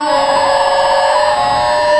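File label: create a vibrant energetic, gambling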